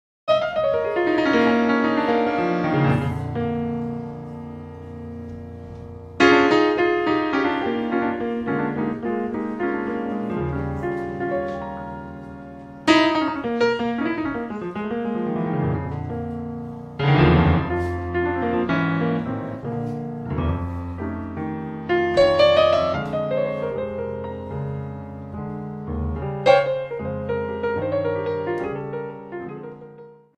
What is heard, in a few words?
Blues